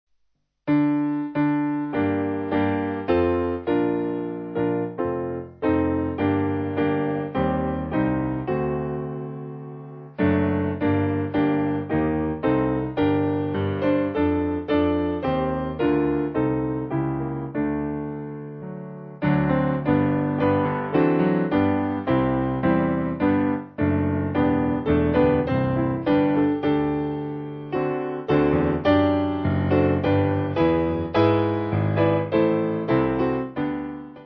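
Piano, Free Music Backing Files for Churches - Piano, Band, Pipe Organ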